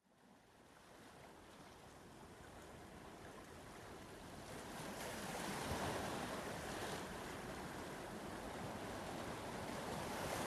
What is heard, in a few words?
Manifesting Meditation Soundscape